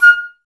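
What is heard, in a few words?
flute, Woodwinds; Sample CDs; Miroslav Vitous; Woodwind Brass Ensemble; Banks W&B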